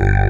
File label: BURPY BASS; Pro-Rec - Synthsonic; BASSES